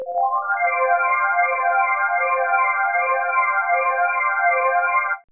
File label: seq loop, ambient; AMG - Vince Clarke Lucky Bastard